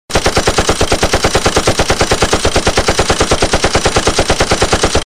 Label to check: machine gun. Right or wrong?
Right